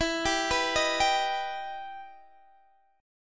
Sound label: E Minor Major 7th E down Guitar Open D